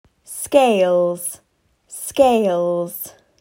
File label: Scales